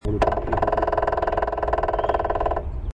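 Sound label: Northern Flicker drumming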